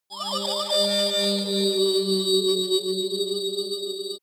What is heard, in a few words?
titan call